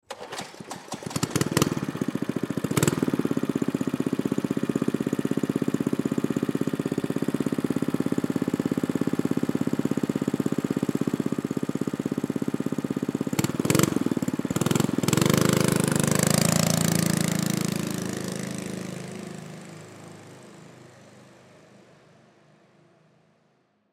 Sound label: Go Kart Pull Start, Go Kart Pull Start Idle Drive Away; Go-Carts; Vehicles; Sports; Blastwave